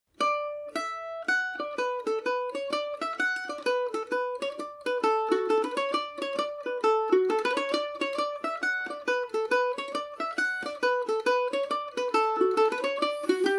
humours of lewisham dmaj, mandolin; Paris Swing Macaferri mandolin

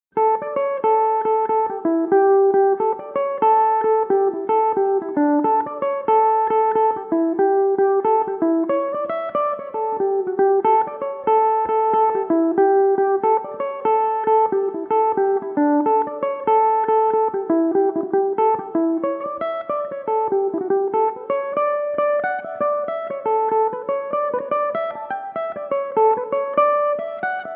Irish mandolin; The Hag With The Money (Cailleach An Airgead); Belmuse EM-190 electric mandolin

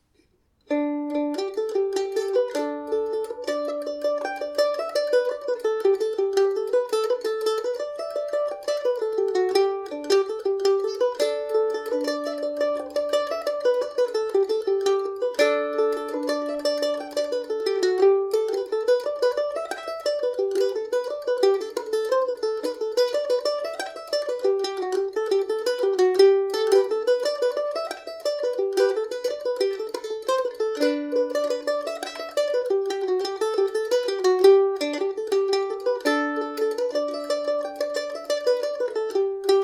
jig, low ending, irish mandolin; kesh

